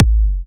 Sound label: Kick